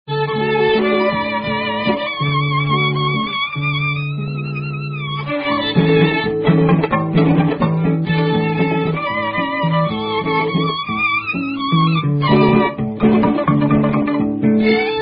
Instrumental; 1968

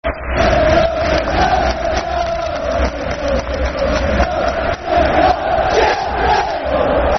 Football Chant (Soccer Song); Sheffield, Sheffield; Sheffield Utd Sufc

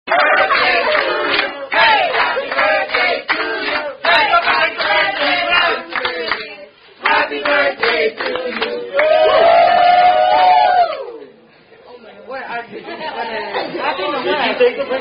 Happy Birthday (Standard) Sung By Wait Staff; Chili's

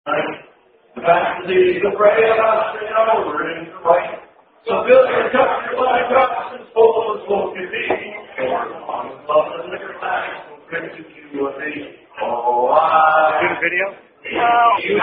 Rugby Road Song; Chi Phi Fraternity Version

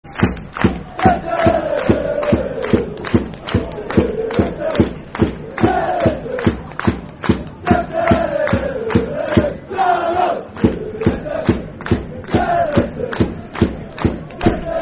Darlo Great Escape, Football Chant (Soccer Songs); Darlington Dfc

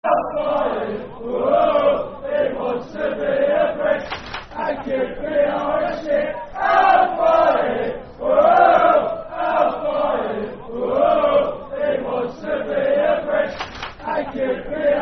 Football Chant (Soccer Songs); He Wants To Be A Brit; Fulham Ffc

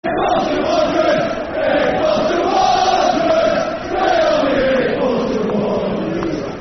We Only Hate Bolton Wanderers, Football Chant; Bury; Bury Bfc

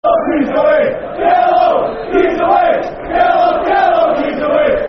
Gis A Wave [Football Chant (Soccer Song)], Hearts; fanchants; Heart Of Midlothian, Hearts Hfc

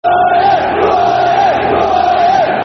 Who Are Ya, Football Chant (Soccer Song); Doncaster Rovers Drfc

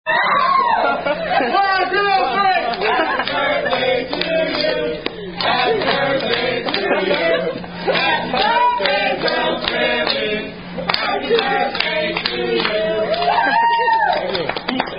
Happy Birthday, Wait Staff; Christmas Party; Happy Birthday (Standard) By Chili's Wait Staff

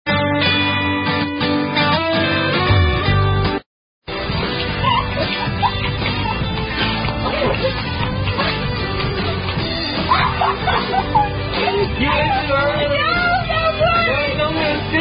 Slide Hand Game, Hand-Clapping Rhyme Game

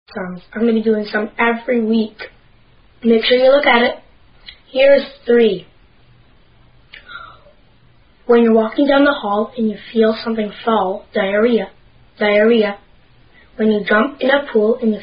Diarrhea Song

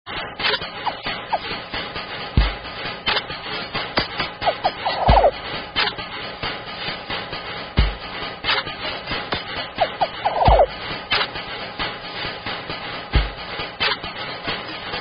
Metal Hits

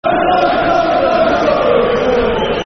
Tommy Sorenson, Football Chant; Aston Villa Avfc

